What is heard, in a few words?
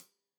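hat closed, hi-hat cymbals stereo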